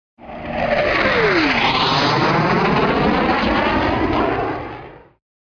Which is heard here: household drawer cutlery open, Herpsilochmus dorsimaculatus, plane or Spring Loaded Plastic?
plane